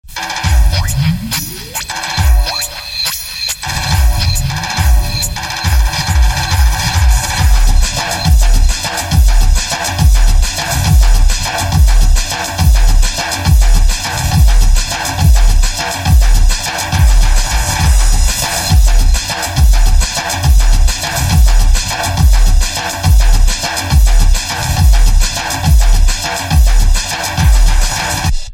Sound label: Tapori Dhol